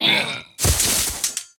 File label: Azari Death; Puzzle Chronicles; PS3; PSP; X360